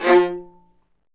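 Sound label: Marcato, Strings; Vlas Marcato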